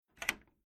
Household Old Door Bolt Lock Locked Closed Slowly

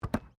RUGBY BALL HANDLE; Various rugby sounds; Miscellaneous Sports (Sound Effects:Sports)

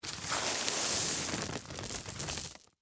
Bag Rocks Dump, Foley, Plastic, Out; Pebbles; Blast Wave

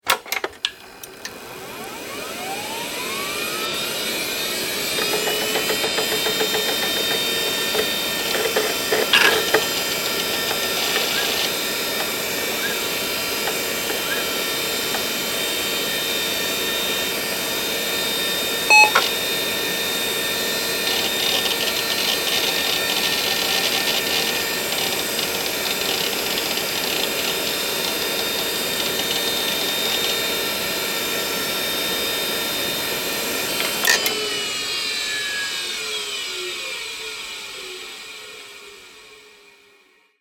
office computer load off, Computer Switched On; Computer Switched On , Loads And Then Switched Off

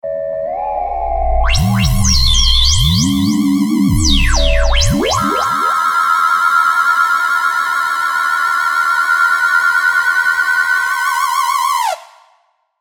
Transmission, Burst, Long, Science Fiction, 5.1

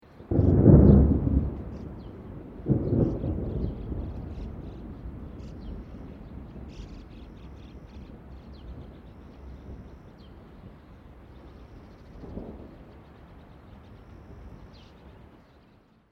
THUNDER DISTANT LOW BIRDS; Elemental weather effects

